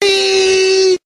eee, Voice; ooh ahh

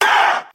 "oh", crowd; ooh ahh

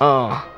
uh; ooh ahh

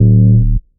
Kicks; Heartbeats

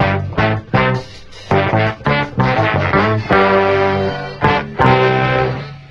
horns, loops, phonky

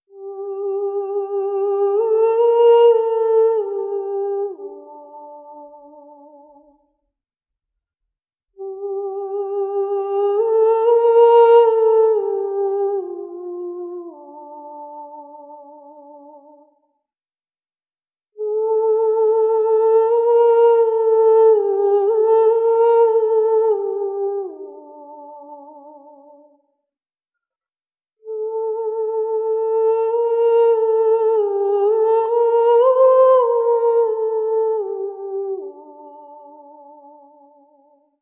voice lamented; Sad

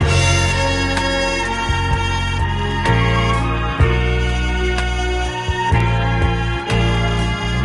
horns, jazz loops

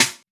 Snares, Rimshot

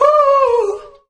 "ooh" SUNG

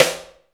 Snares, Rimshot

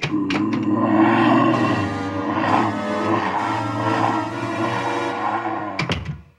monster; Animals

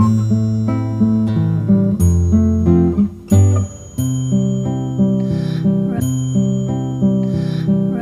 guitar, Loops, Phonky

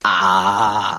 "ah", PAIN

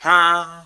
huh

